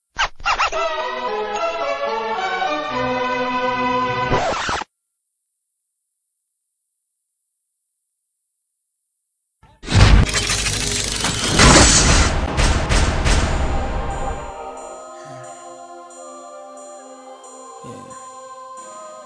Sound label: HIP HOP